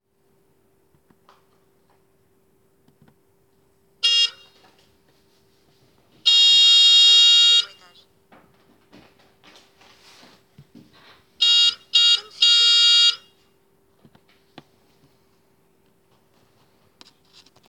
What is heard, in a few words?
klaxon, mehari